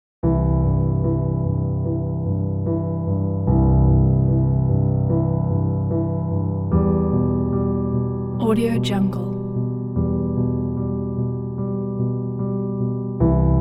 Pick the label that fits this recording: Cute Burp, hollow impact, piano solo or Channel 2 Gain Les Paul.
piano solo